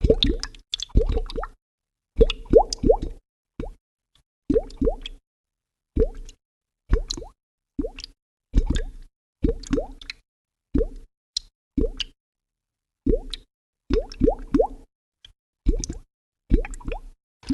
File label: gurgling